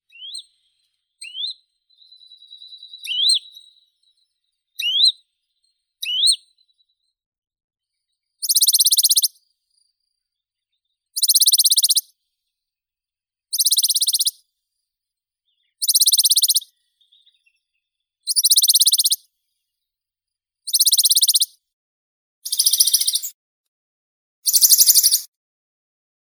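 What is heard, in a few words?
Bonelli's warbler, Phylloscopus bonelli; penochka belobruhaya; zvuki prirodi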